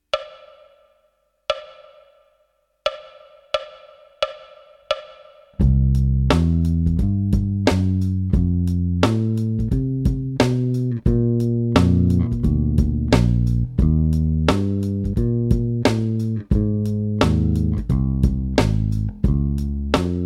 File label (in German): Dur Dreiklaenge Viertel, four strings